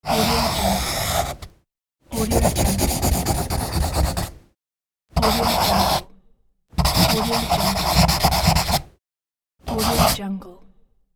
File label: pencil scribble and drawing